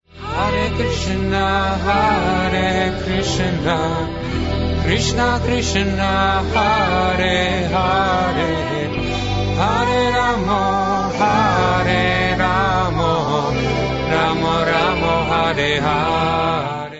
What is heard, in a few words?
Hare Krishna, Electro Punk